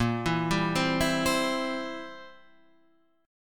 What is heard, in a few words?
C Major Bb